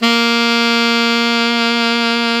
SAX ALTO MF, Tube; Roland LCDP07 Super Sax

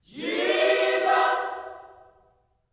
JESUS, Gospel; Spectrasonics; Vocal Planet; GOSP CHORD

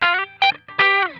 GLIDE, Funk Guitar; Zero G - Funk Guitar

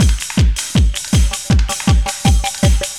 LOOP, Eurotech; Sounds Good

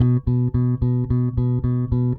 Eighth Notes B, Bass; Construction Kit; Bass X

